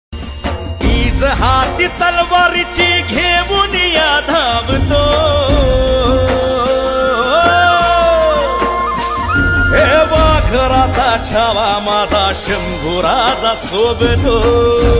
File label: marathi ringtone; chhatrapati shivaji maharaj